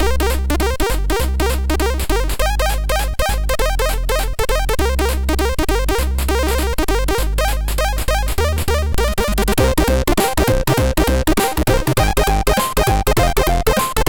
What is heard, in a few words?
sid MOS6581; MOS6581R3